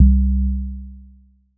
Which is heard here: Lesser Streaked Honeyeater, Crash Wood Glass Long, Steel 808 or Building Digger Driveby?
Steel 808